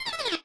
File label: Btl Actlrng Failure; The Denpa Men; Android